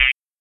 voltage; twitch

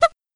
mac brasshorn; twitch

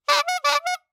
bikehorn; twitch

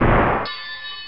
mac bang; twitch

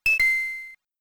correct; smrpg; twitch

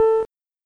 mac beep; twitch

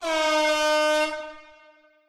airhorn; twitch

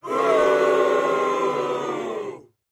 crowd boo; twitch

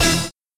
doot; twitch

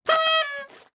party horn; twitch

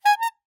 bikehorn; twitch

